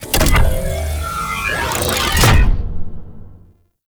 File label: Turret Harness Underneath Equip; Warframe; Vehicles; Rail Jack